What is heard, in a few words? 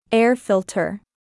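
air filter, bathroom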